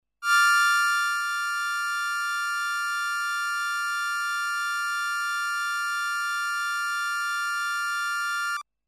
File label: Original Drone